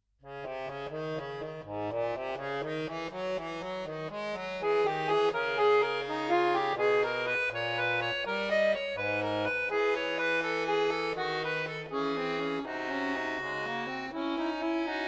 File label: Fugue in C sharp minor